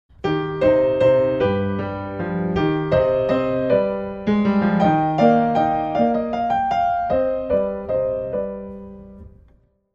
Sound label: minuet; Mattheson, Minuet